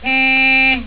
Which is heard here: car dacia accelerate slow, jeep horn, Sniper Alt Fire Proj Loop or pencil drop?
jeep horn